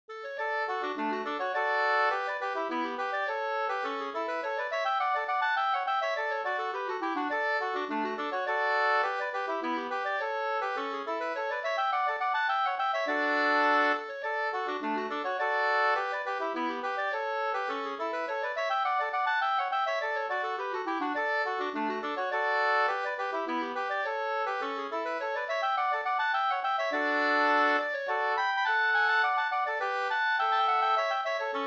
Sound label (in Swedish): polska Langpolska; efter "Korp-Erik", Erik Olsson, Korpåsen, Hassela, Hälsingland; med andrastämma av Edvin Bergqvist